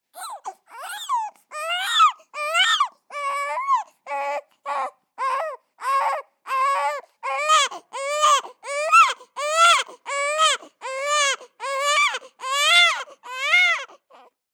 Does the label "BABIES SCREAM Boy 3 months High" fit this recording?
Yes